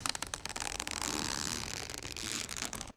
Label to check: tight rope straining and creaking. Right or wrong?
Right